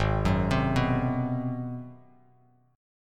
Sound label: G sharp 7th Sharp 9th, Bass Standard; G#7#9; arpeggiated; 4,9,10,4; JGuitar